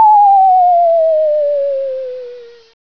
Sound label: Fall; Macworld (1999-04); Games World; Shareware Games; Unicycle! 1.4